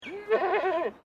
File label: Animal Goat Baa; Animals from Edgar's Mission farm in Victoria; Goat Sound Effects; Goats (Sound Effects:Animals); Foundation1